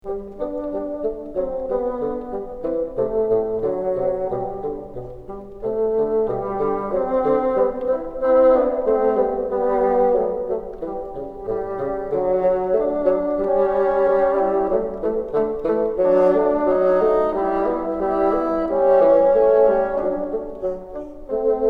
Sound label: together, bassoon; Repeat the vibrato exercise from Unit 48 on the following notes: G1, D2, F#3.